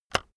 keyboard external enter release heavy

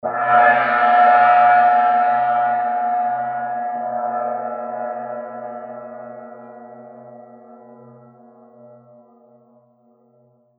tibet sbub chai cymbal

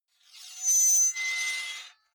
sword blade screech high

